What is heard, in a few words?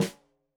Snare Stereo, Drums; Deeply Sampled Drums